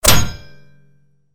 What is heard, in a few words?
bullet impact metal heavy